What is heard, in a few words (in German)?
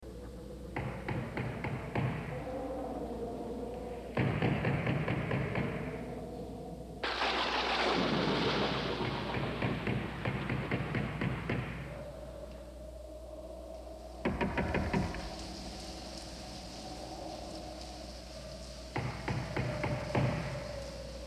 Horrorgeraeusche Klopfen An Der Tuer